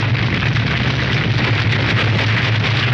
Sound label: Fire Loop; SFX Kit; Destruction